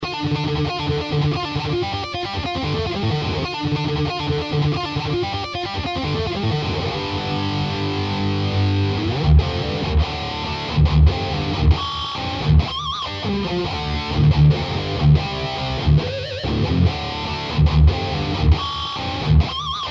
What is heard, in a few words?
metal